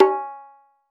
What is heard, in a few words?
Tabla Tun; Roland BK-5; Percussion